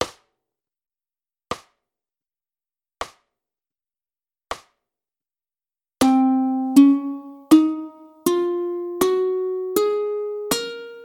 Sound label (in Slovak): ukulele stupnice lokricka